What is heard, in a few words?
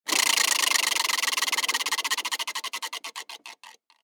wheel